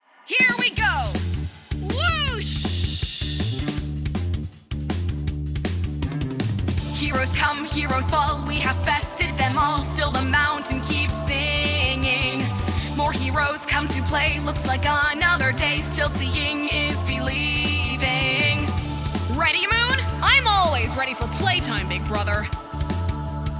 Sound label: singing mountain; chrono trigger the musical